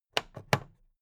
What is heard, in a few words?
plastic clasp close soft